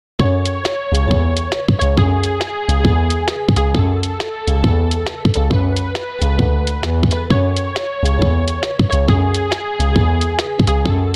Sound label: dark hiphop rap beat